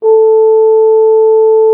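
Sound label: French horn A3; Xsample VOL-3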